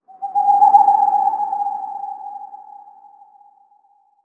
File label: environment bird